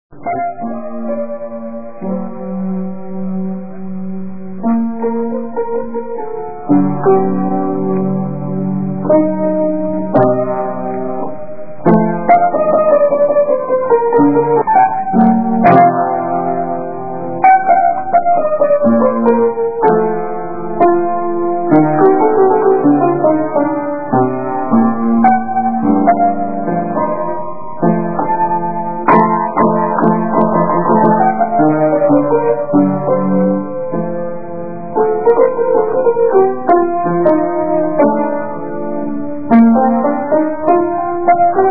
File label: piano